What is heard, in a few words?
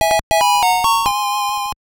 Sound Extra Life, nes